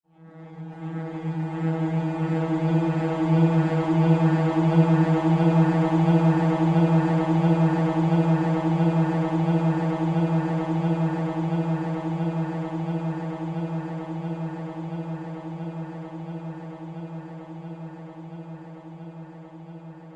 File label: Black Magic Whoosh